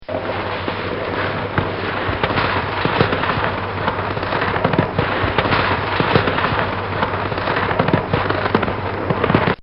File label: Driving In The Snow, Car; Cars (Sound Effects:Vehicles)